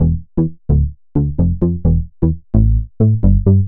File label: Bass, uk garage, 130bpm; Zona